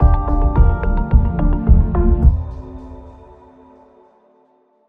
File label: Bumper